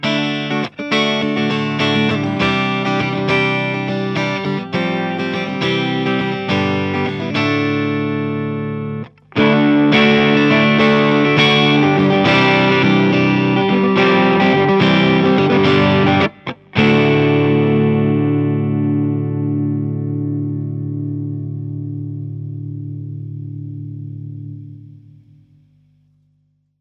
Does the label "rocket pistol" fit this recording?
No